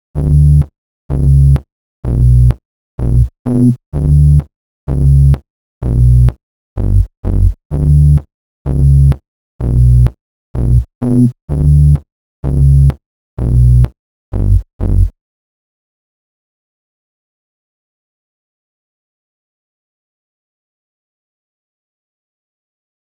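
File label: 127 B minor BASS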